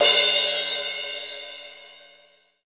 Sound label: crash single shot e key, Royality free crash tuned to the E note. Loudest frequency: 5881Hz; Cymbal; drum sample; single hit; one shot